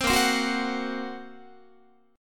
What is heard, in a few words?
Bb Suspended 2nd Sharp 5th Bb Guitar; 15,18,15,16,16,17; strummed; 4A,4E,5A,5D,5G#,6C#; chord shape; JGuitar